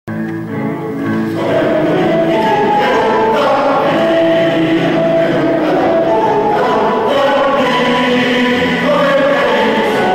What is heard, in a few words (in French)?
choeur des courtisans; concert jurancon; rigoletto; g.verdi